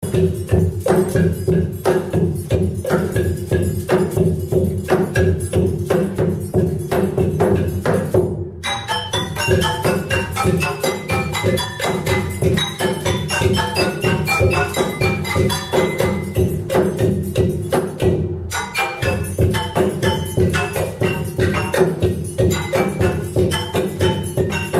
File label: percussando